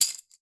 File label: toy tambourine single hit; sound effects one